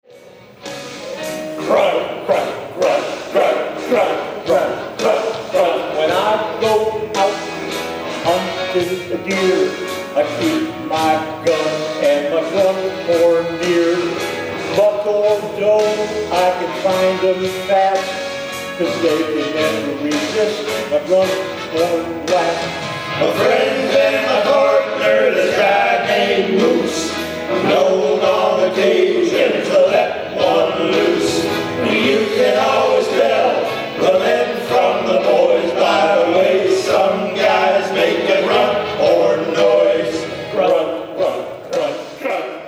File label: Grunt Horn Song; DEER